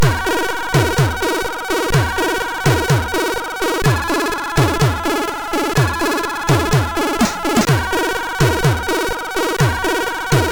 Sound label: Music Sample, sid MOS6581R3